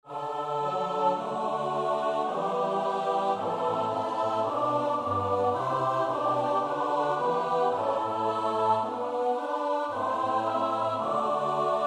Psalm 27 S-A-T-B; NPB

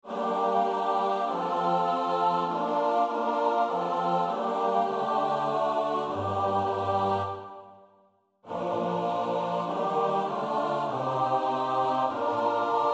S-A-T-B; Nieuw Liedboek; NLB 100

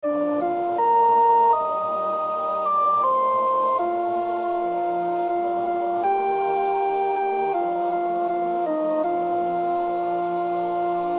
sopraan; An Irish Blessing

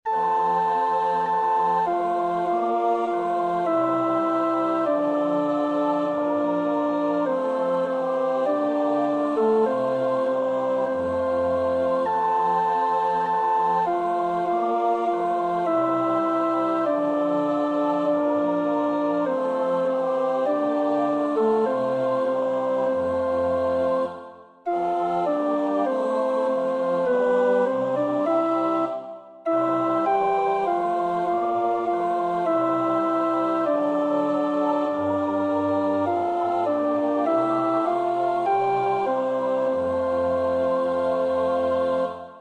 Es ist ein Ros entsprungen (alt), Oefenbestanden; Praetorius (Michael)

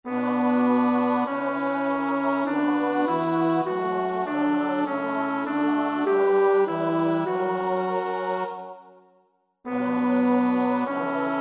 bas

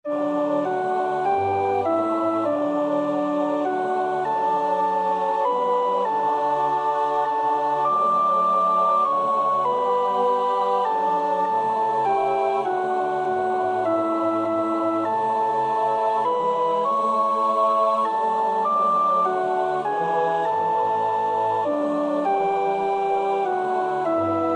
sopraan; Nieuw Liedboek; NLB 534 (Hij die de blinden weer liet zien); Vesperkoor Bunschoten-Spakenburg; Oefenbestanden

